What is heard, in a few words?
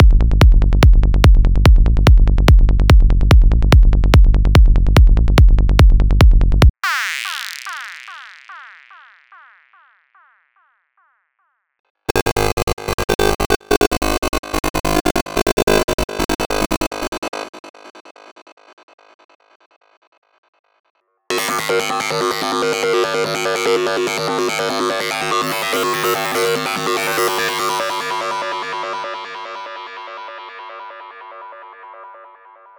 Psytrance